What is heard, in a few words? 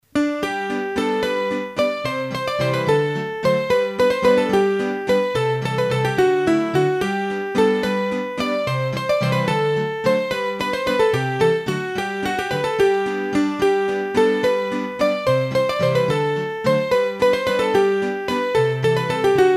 Valse, hurdy-gurdy; Valse a Eric; Massif Central